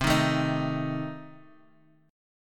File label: C Minor 6th, C, down, Guitar, Drop D